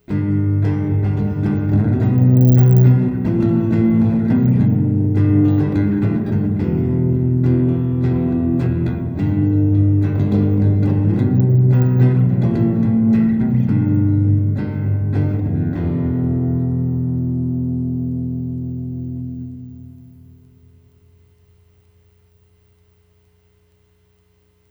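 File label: guitar half reverb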